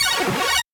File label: synth; osu